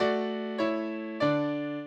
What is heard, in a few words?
minuet, mozart